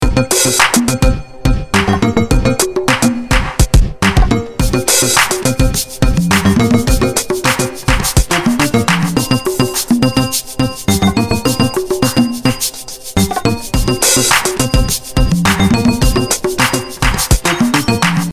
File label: dance ringtone